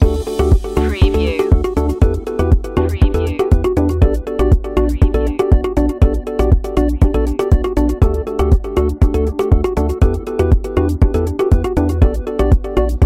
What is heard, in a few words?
communication beacon loop